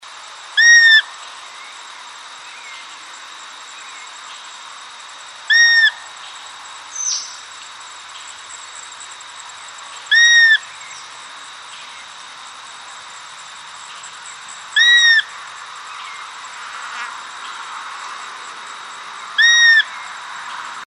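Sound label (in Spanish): Burlisto Pico Canela, Myiarchus swainsoni, Swainson s Flycatcher; cantos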